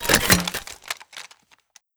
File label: artillery reload; tf2classic